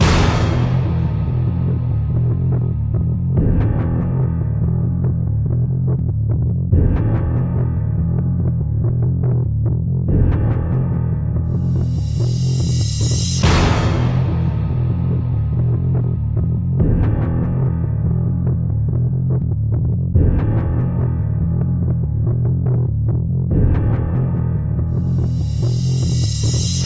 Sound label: Battle Boss; Infinity Blade Saga; android; xbox series xs